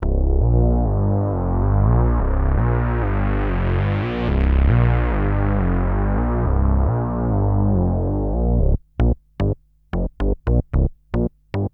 Synth hits and riffs

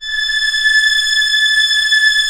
STR D#5 PP L, STRNGS, Orchestra; Sound On Sound - Peter Siedleczek's Orchestra

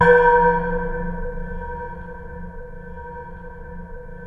space bass F; InVision Interactive; Lightware VOL-1; Instruments & Percussions; DEMBY

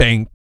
PERC, Vocal, Jazz FX, BASS PERC

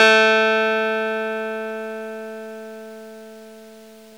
Clavinet A3; Big Fish Audio - First Call Vintage Keyboards

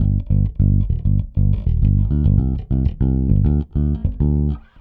Pop, Bass

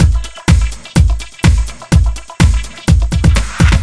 125 Fill, Drum Loops 125bpm; Zero-G; Soundclash Nu Dance Electro; Acidized Wavs; Heavens Above

